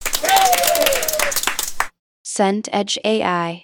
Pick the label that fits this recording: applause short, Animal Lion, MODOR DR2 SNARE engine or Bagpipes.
applause short